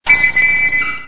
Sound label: whistle; FOMA